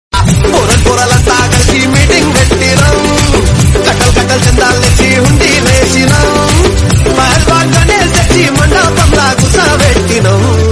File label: Ganesh Song ringtone; ganapathi; Telugu